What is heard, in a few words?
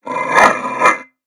Pet Dog Robotic Angry; creatures; pets; Lego